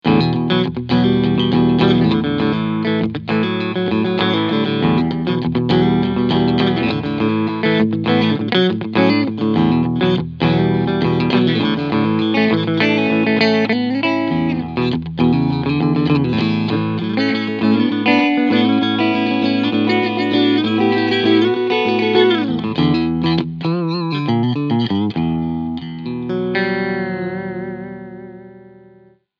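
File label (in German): Fender American Ultra Stratocaster Cleansound Steg Pickup S1 ON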